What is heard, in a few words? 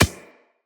Beach Ball Bounce Dirt; Water Fight; Beach Ball Floof